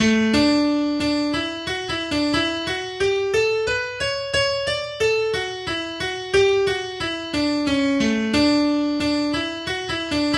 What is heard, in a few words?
little slow Mazurka; May Mazurka